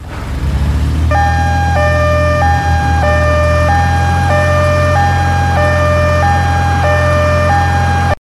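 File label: vehicle start loop police; The Sims 3; windows gamerip 2009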